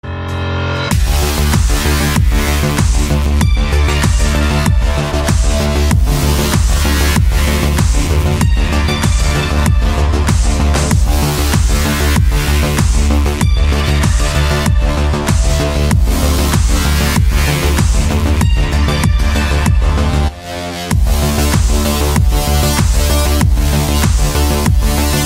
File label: Synthwave